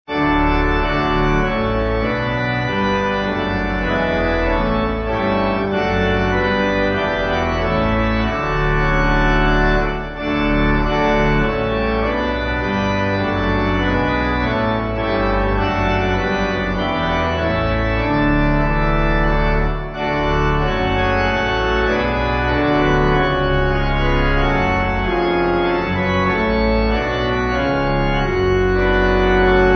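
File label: Pipe Organ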